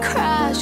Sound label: crash